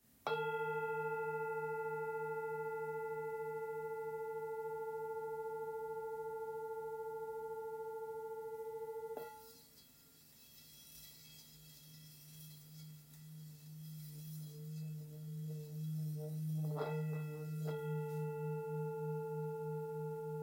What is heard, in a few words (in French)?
Bol ancien re